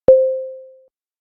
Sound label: beep